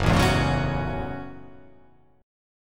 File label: F sharp Minor 9th F sharp